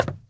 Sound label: shelter step; Original TK Sounds